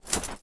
build; ships; ratika

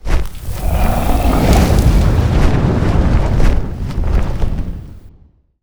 plume, dragon; combat; creatures

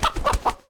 hurt; CosmicRage; combat; creatures; chicken

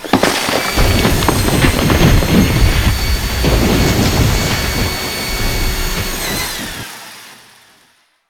drill; CosmicRage; activities moon

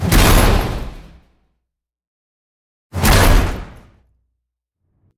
metal; combat; rocket sounds

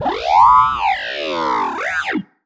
sad, Baby

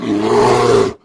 bear

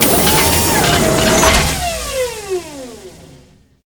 extend, battlesuit; Cosmic Rage; general combat

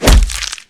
kick; combat; enemy

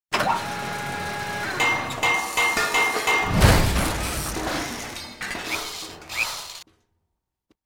repair, ships; CosmicRage

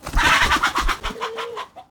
die; CosmicRage; combat; creatures; chicken

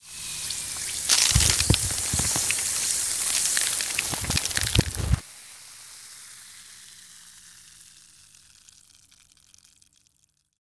dissolve, hand mining; CosmicRage

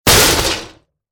crash; vehicle

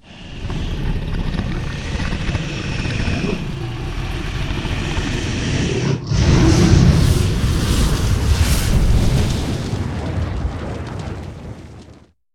fire cloak, dragons; general combat

